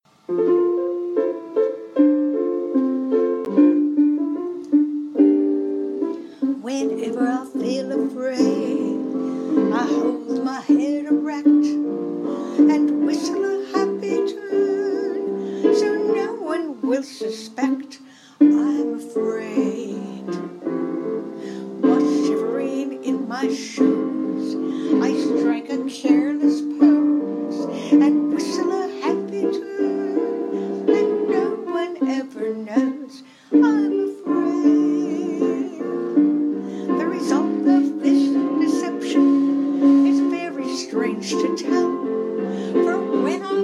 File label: practice